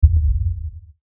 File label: LFE Hit, Lfe Hit Short Impact; Blastwave